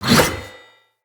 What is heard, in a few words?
Dagger Melee Whoosh Heavy; Weapons; Player; Swords